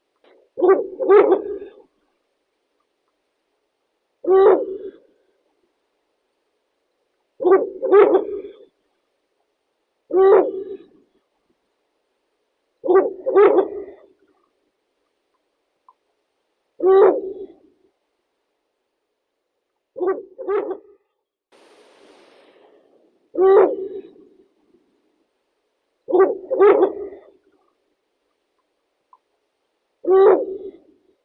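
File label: Ural owl Strix uralensis girl, nature sounds; neyasit dlinnohvostaya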